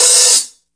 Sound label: HH, Drum Dry; East Collexion; Drum 1 Dry